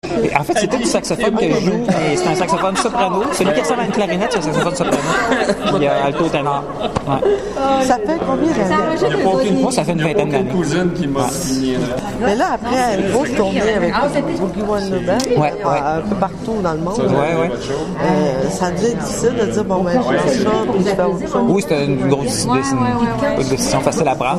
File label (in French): saxophone